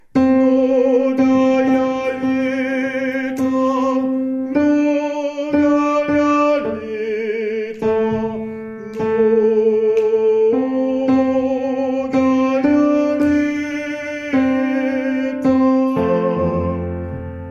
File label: Tenors; MNOGAJA LJETA